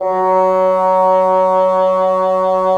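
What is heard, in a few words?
WND BN, wind bassoon; LA Composer vol. 3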